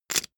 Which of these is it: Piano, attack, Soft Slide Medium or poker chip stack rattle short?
poker chip stack rattle short